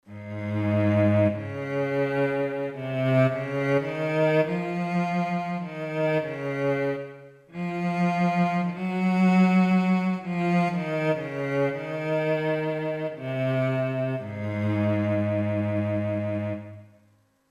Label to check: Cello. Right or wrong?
Right